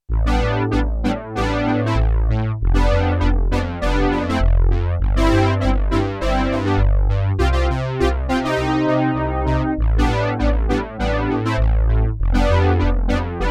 Poly Brass, Roland JX-8P

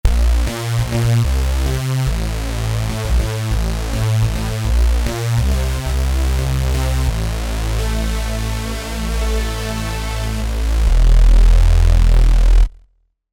Nasty Bs, synth

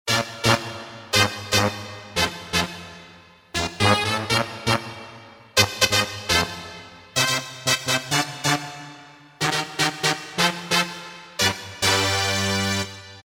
Brass, Casio CTK-601 Tones

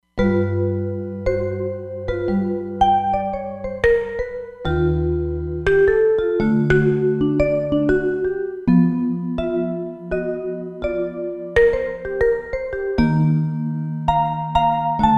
Airie Vibez, Roland Fantom XR

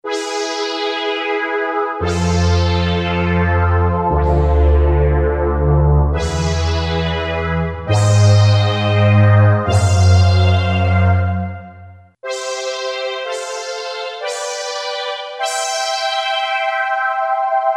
Analog Pad; Yamaha PSR-550

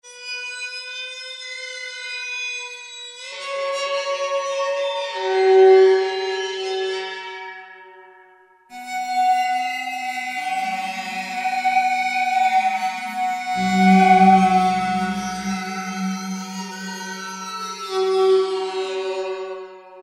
Water, Alesis QS8; Factory Preset examples

